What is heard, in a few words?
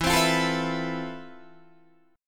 F Major Sharp 11th E